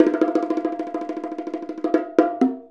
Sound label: Bongo Roll of Suspense; Essential Percussion